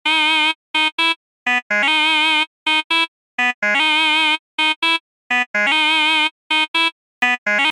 Lead; PatchFactory

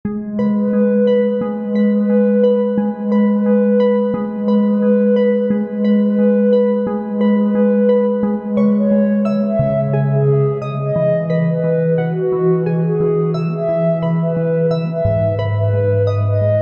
Lead; PatchFactory

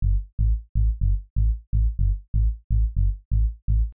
Bass; PatchFactory

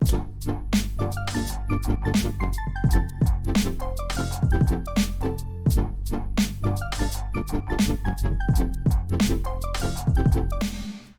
Keys; Daydream

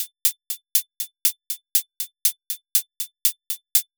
Hihat

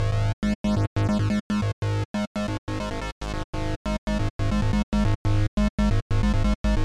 bass; Transtar

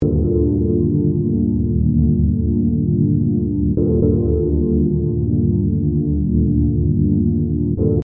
Chords; PatchFactory

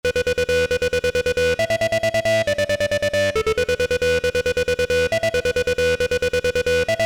Lead; PatchFactory

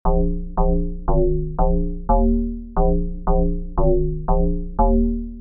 Bass

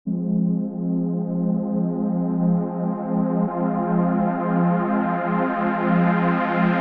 Pad; PatchFactory

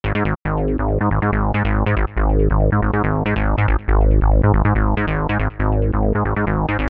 Bass; Transtar

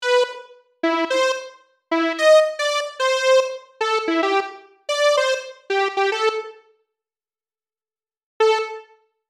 Lead; PatchFactory

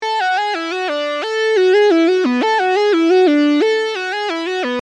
Lead

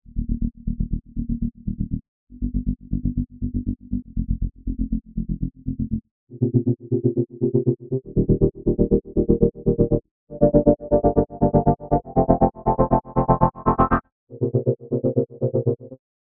Bass

